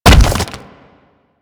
Bolt Impact Torso; Warframe; Weapons; Cross Bolt

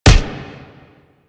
Alt Punch, Weapons; Warframe; Fulmin Prime

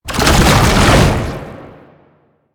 Tank Infested Strafe Start, Movement; Warframe; Lasrian Tank

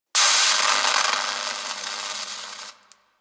Destruction Electricity Sparks; Ambience; Warframe; Corpus Railjack

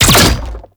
Finisher Impact; Warframe; Man In The Wall; Enemy Gameplay

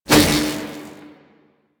Whoosh; Warframe; Quests; The New War; Drifter

